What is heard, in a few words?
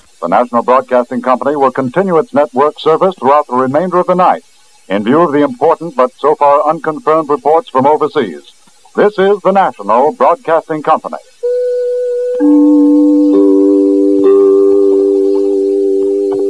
4th chime; MI6; paraset; PSU 6V